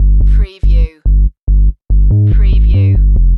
Techno-Trance Sub Bass Loop in G 142 BPM

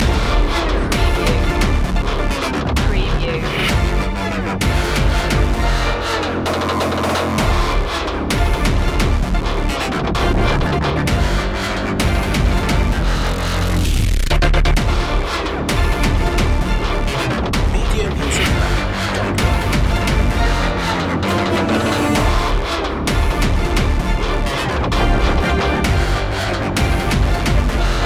hybrid orchestra loop

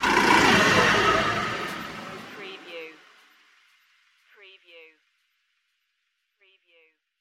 monster machine passing

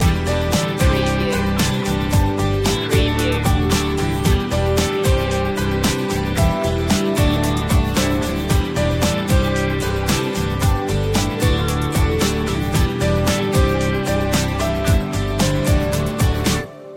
banging pop loop

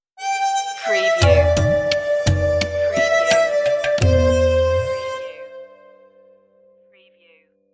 indian lullaby stinger

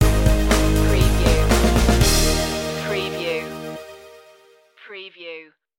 photograph stinger

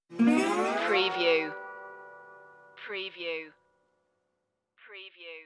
cajun stinger

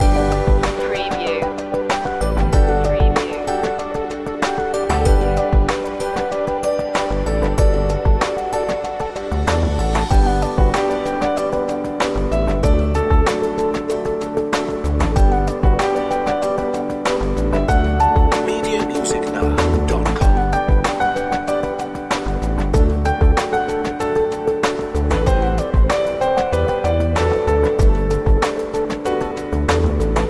friendly corporate theme loop

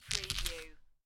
Dice Grab Collect Five Dice From Felt

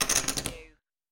Poker Chips Drop Stack Of Chips On Wood Table

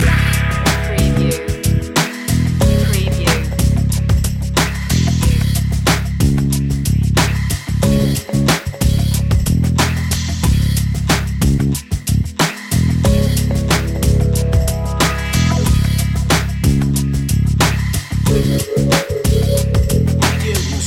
jazz loop

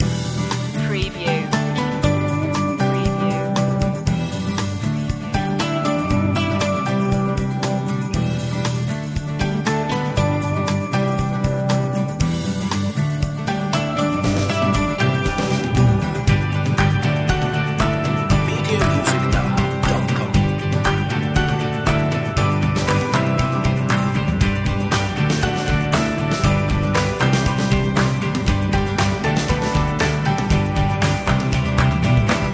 just smile loop

